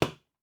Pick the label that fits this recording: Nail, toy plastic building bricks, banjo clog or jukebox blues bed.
Nail